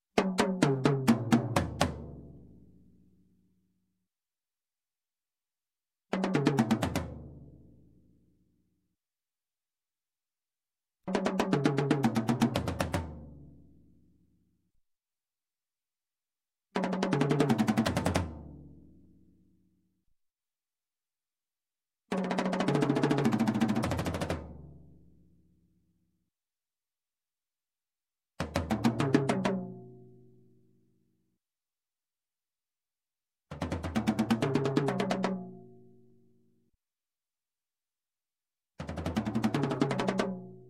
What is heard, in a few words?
Drums, Tom Tom roll, Music, Percussion; Sound Ideas; Series 2000